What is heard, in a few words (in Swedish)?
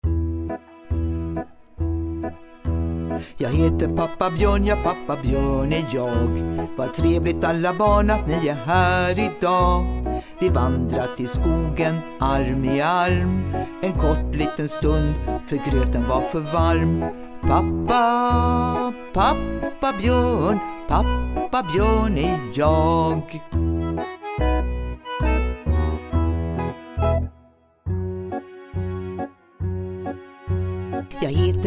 musik med sang; BJORNMUSIK